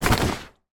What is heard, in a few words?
foley inflatable lilo down on hard surface; sound effects one